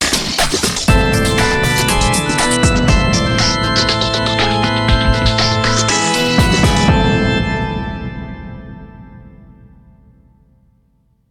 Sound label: Gameover; Shaftdiver